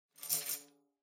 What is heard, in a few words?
Tambourine Noise